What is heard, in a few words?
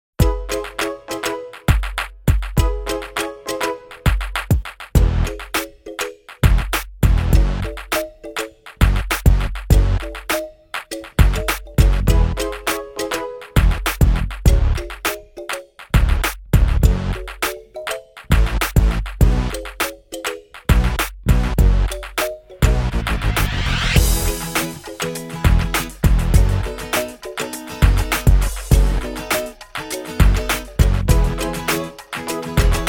Accompaniment